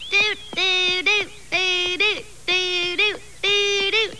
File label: hum; gohan